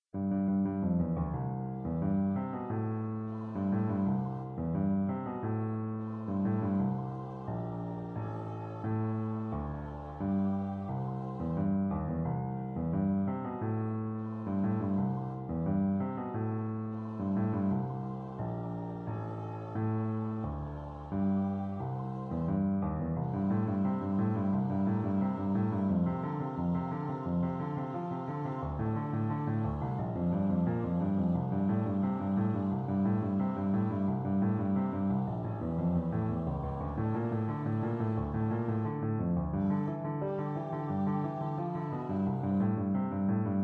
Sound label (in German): Klavier